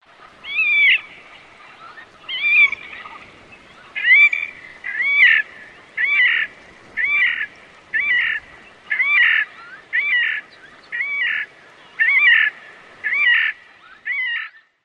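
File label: typical calls, horned grebe; AK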